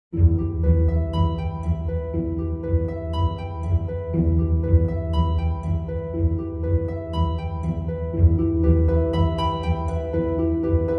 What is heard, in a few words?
March